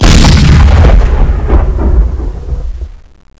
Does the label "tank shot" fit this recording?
Yes